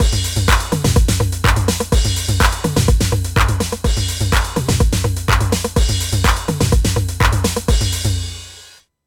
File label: TR-8 over